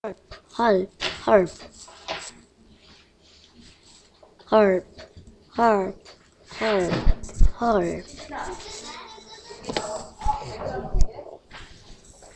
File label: harp